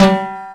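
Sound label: timbale hit; Sounds Good - Roots Reggae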